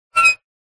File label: household popcorn maker machine glass door open squeaky